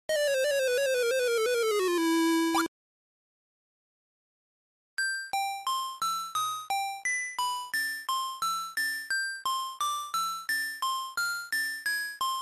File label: game over